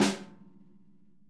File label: snare, Interactive Drum Kits; Sonic Reality